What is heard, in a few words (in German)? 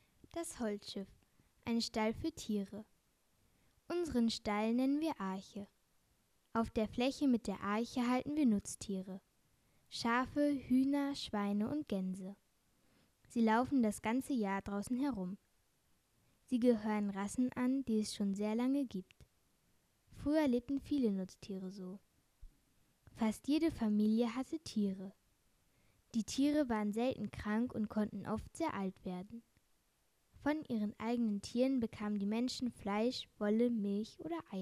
Kinderstimmen; Arche